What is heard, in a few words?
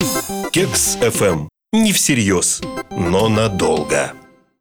jingle